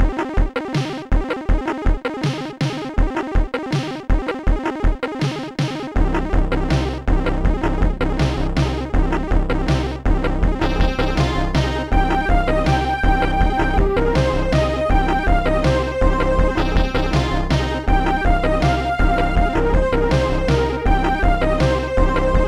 arpeggio!; Sonix Music Driver

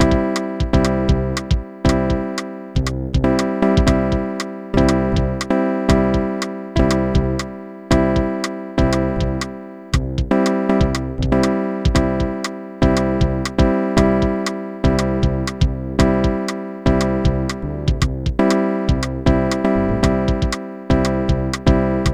slow funk alt style; SuperJAM!

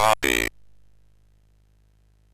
sid MOS6581R2

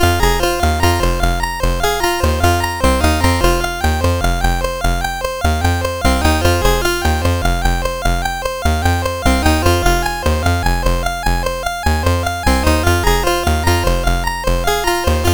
sid CSG8580R5; Mondus Fight Palace

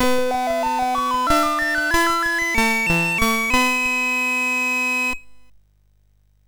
Buzz Off, MOS6581R3

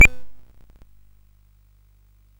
sid CSG8580R5; Magic Serpent

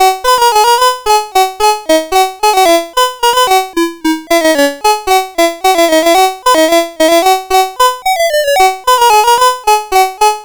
sid CSG8580R5; Out on a Limb

